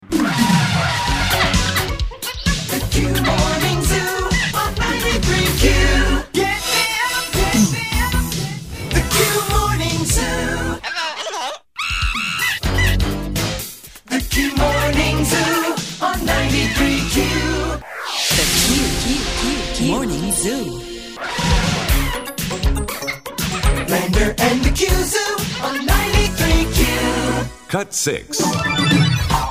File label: Jingles